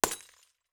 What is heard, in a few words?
Light Bulb Burst; Rebel Cops